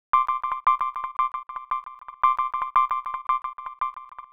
80s Arcade bonus; Nokia

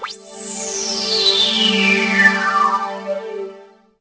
Startup Melody; BenQ-Siemens S68

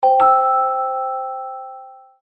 Simple Notification, Celesta; HTC

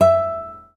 Classic Guitar; Realme 8

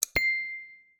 swingdown start diamond; LG Wing 5G SKT

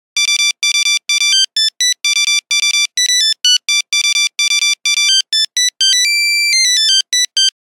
Nokia Buzzer 6210 William Tell; Windows Phone apps

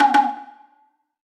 notice; freetel

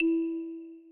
Class Electronic, notifications; OnePlus OxygenOS 13; dynamic notifications

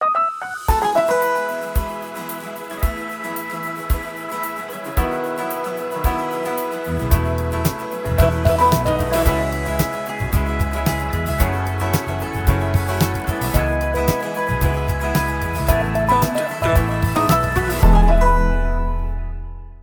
ringtone by default; Ucell

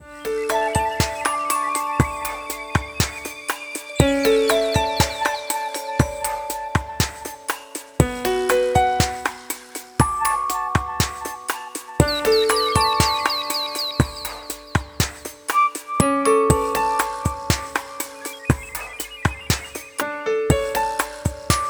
Springtime, alarms; LEAGOO; M9

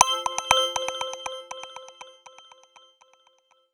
Bouncing alert; Sony Ericsson; W508; PlusFourSix; Brittany; Paul Bergman

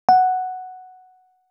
notification text message im received; BlackBerry OS-10

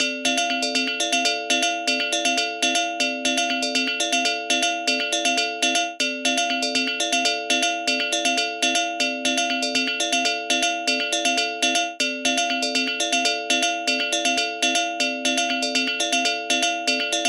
call mail; HTC; Infobar-A02

